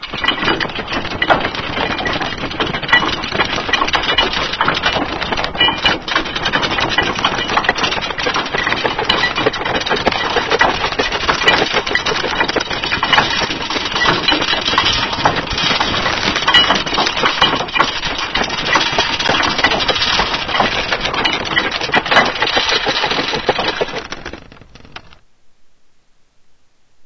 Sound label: Trebuchet Draw